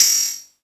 perc, Drum & Bass, 160 BPM

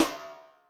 Ruberbnd, Electric Snare, Yamaha DTXpress

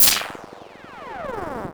Cwejman S1; Hatter Field; DevSnd Cwejman S1 - RD

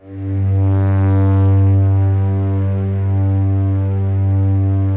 DEEP PAD G1 L, Yamaha VP-1; DEEP-PAD 3; Music Store

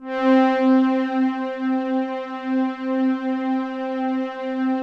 OB LOW P

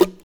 NOISE, guitar

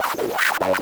Distorted Reality; Spectrasonic; DIGITERROR

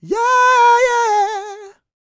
SING, Vocal Planet R&B & Dance; ILIO

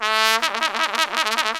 THE TRUMPET

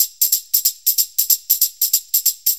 Shuffle Perc, Perc Loops; Sonic Foundry; Sony Creative Software; Loops & Samples Drum Tools; Shuffles

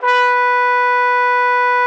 TROM B4, TRUMPET; Xsample VOL-3

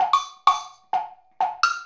Wood Blocks and Bells

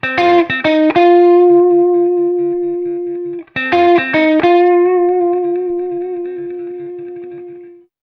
8TH MEL IV-L, Hot Steel Blues; ILIO; 138 8TH SHFL

